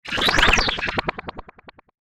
Sci-Fi Helicopter; Science Fiction Sound Effects

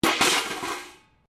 metal rubbish bin lid smash concrete

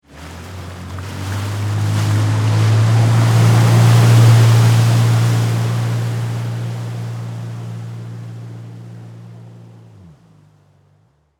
Jet Ski Away Slow, Watercraft; Transportation; Boats

